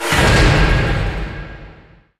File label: Save Game; God of War; PS2